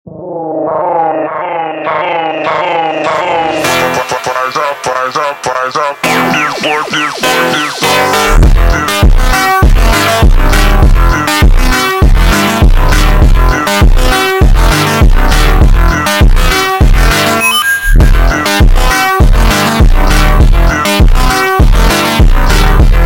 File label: Montagem super Slowed